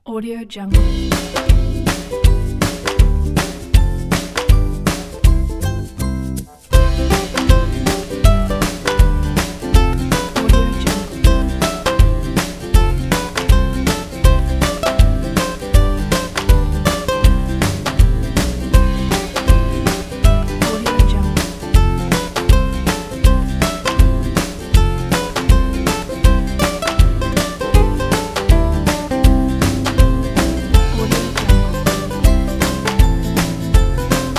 happy children

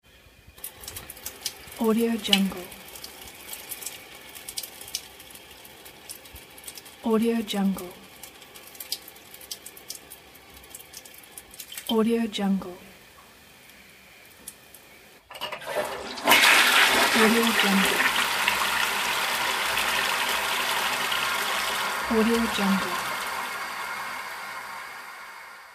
male peeing in toilet

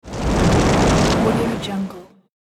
fire flame

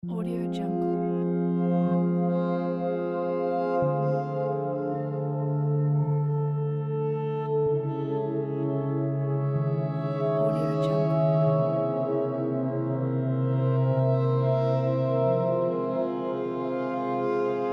tragic orchestral soundscape

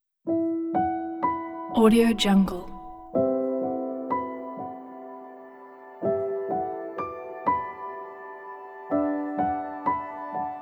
oriental shadow dance

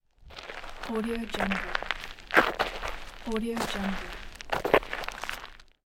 walking slowly on gravel path

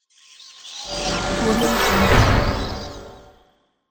magical spell sound

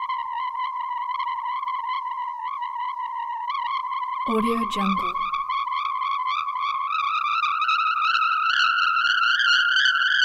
unbearable air squeak

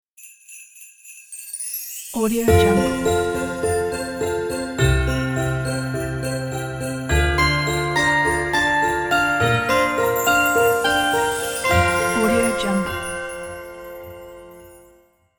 christmas snowflakes ident

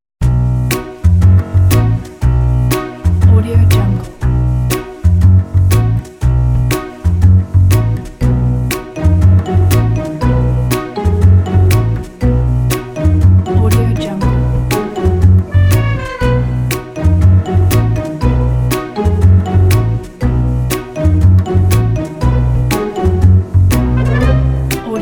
comedy scene; audiogong

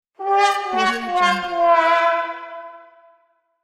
failure

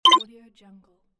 hitech click

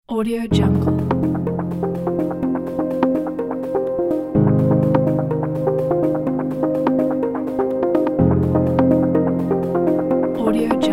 abstract chill out

